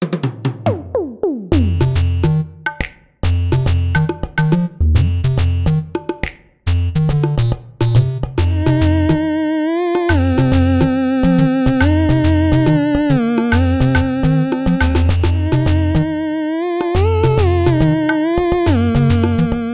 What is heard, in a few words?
alien karaoke; moshlab